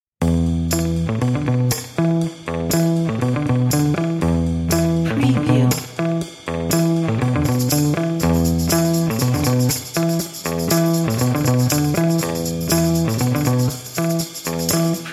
vintage funk

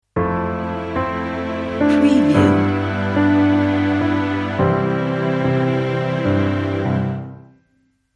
classic logo

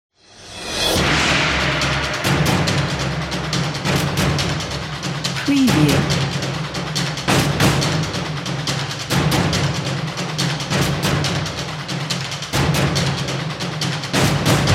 percussion show

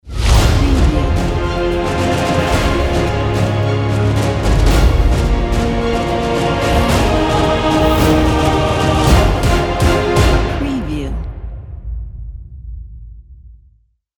epic sport logo

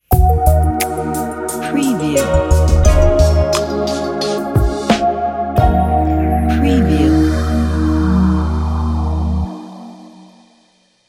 ambient logo

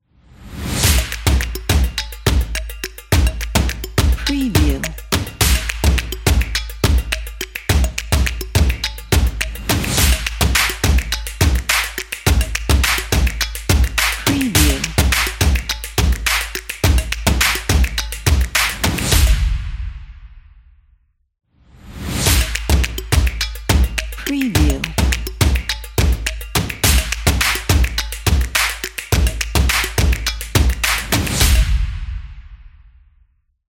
stomp clap and ethnic logo

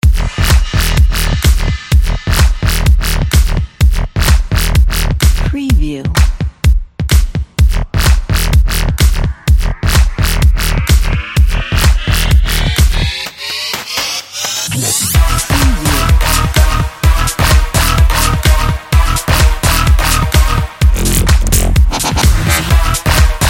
bass house heavy loop

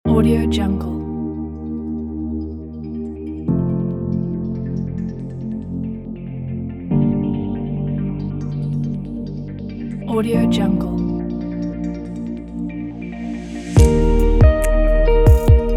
background music

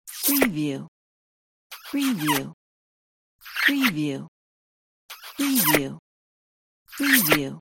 comedy kiss

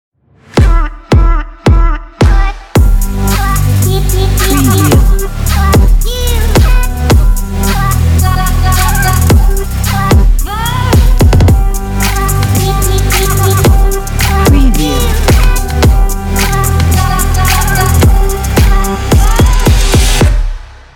stylish promo opener

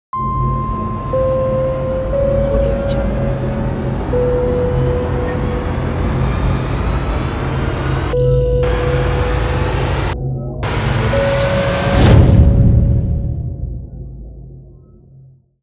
horror logo

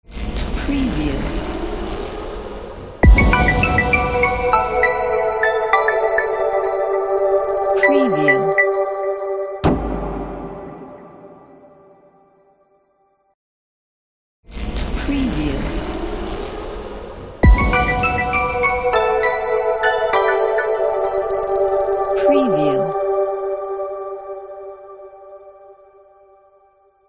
soft bells logo

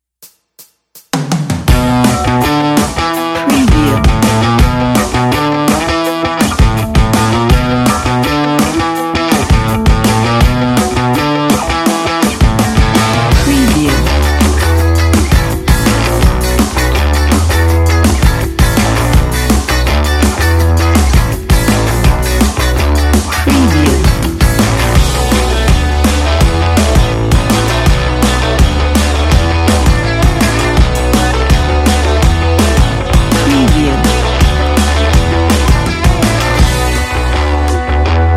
sport action rock

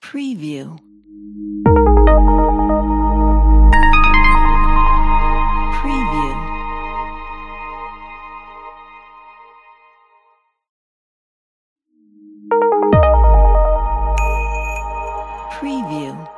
modern cool logo

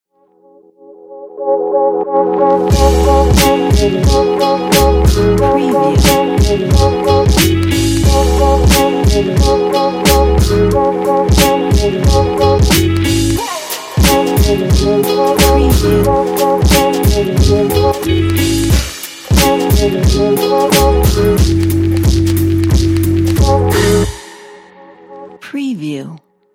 hip hop promo ident

